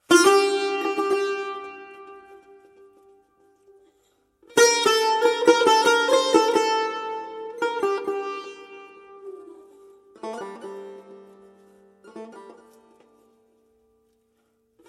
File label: Tar; Variasion On Ashegh